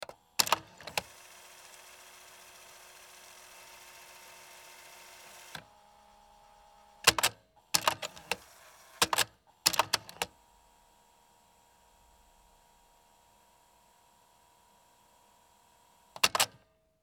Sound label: Answering Machine